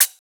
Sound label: HiHat, Psytrance; Drum Shots